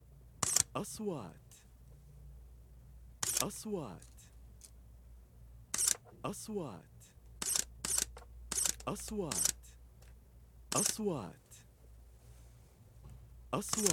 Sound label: shutter camera